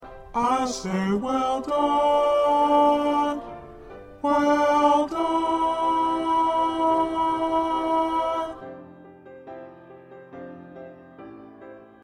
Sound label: Bass 1